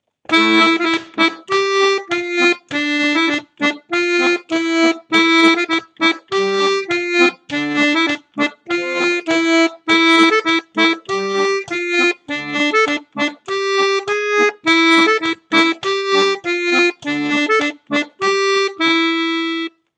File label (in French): 3ème voix; Rondeau 2021; Dedins Tolosa i a nau filhas